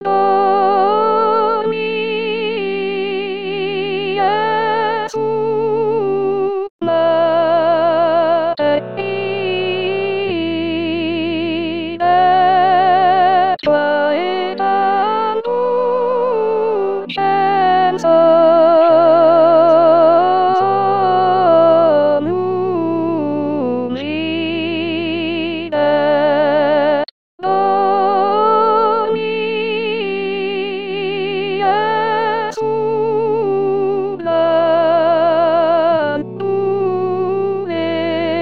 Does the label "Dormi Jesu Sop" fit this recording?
Yes